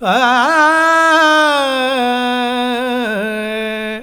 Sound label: Vox, Vocals Male, World Pop